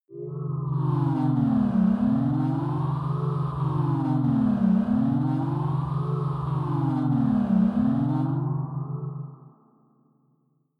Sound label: ufo hover